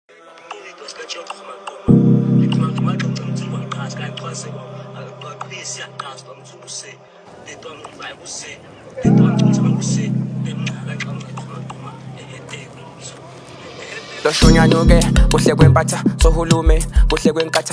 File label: Chant